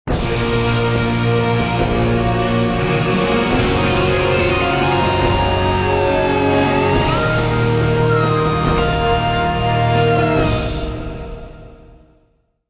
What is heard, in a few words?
Modern Agitated; Civilization Revolution